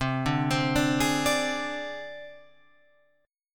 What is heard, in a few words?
C Suspended 2nd Sharp 5th C down Guitar Open F; Csus2#5 arpeggiated; JGuitar